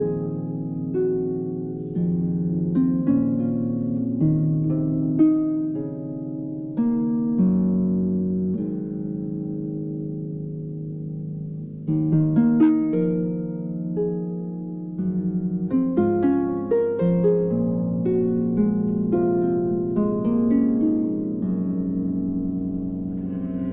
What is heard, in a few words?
Harp Concerto Lullaby